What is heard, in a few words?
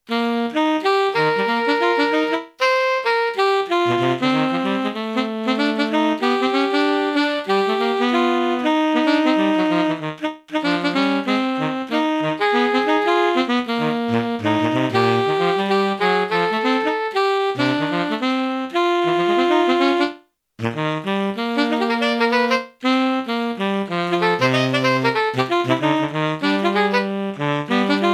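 Tenor Sax, STANDARDS TENOR SAX DUETS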